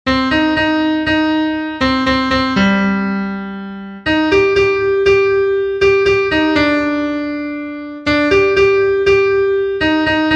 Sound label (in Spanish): contralto, himnos en piano; cantos del camino